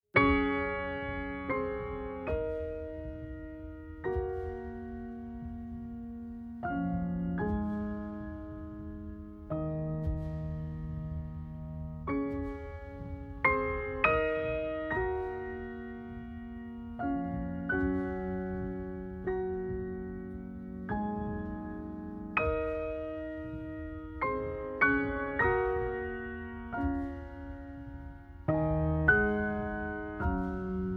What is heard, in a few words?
piano